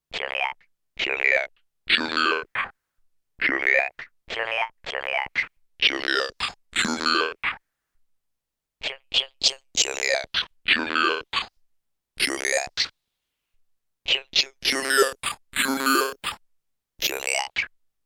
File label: microfreak V4 Presets